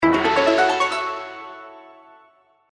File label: grow; Journey To West